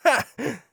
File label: laugh short; Kurzes Lachen; Scout